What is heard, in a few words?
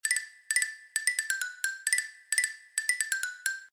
Baby Bear Happy